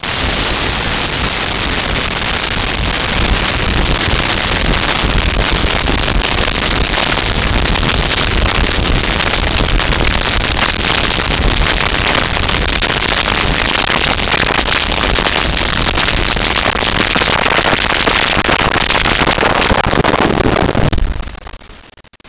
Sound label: Lightning Strike & Thunder